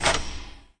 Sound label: eject button off; Inception The App